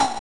Woodblock, Genesis; M8

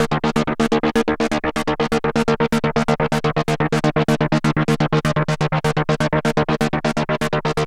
LFO 125, SYNTH SEQ; AMG; Global Trance Mission VOL-2

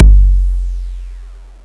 SH101 Bassdrum; Urban Shakedown

